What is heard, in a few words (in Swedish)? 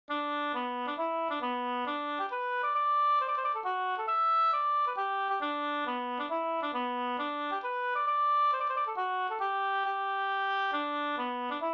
hambopolska; Brors hambo e Bror Dahlgren Sk